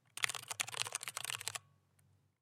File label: clear switch typing